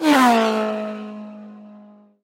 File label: Player Speed Up